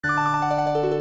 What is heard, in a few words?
stop